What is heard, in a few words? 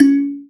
KALIMBA; Spectrasonics; Heart of Africa; KALIMBAS